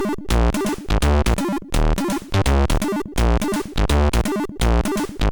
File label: Blues, MOS6581R3; Spike Super Blues Experience; hvsc